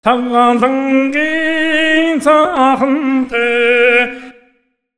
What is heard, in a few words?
MONGOL V, Asian Traditions; USB Soundscan vol.60; AKAI